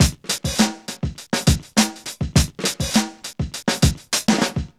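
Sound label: 102 bpm, Funky loops; Zero-G; Funky Elements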